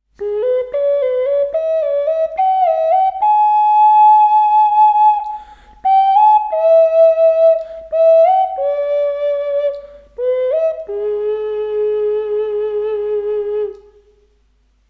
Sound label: Ocarina; OcarinaNAF; tear drop ocarina native american scale; woodwinds; bamboo flutes